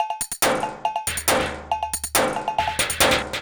Tins Topline (140BPM), household rhythm samples Loops